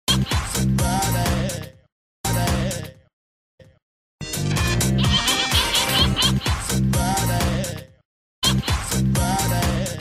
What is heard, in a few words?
squeak nokia music ringtone